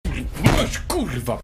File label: smash